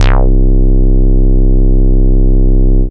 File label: Single Electric Basses, Dance Floor Bass